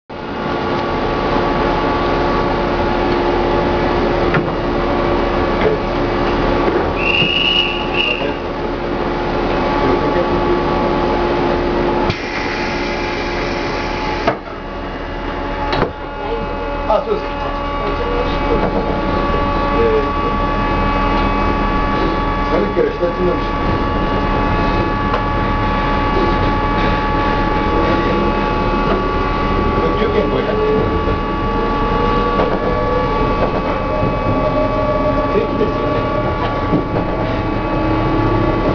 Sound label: train motor sound; 651; sanuki-ushiku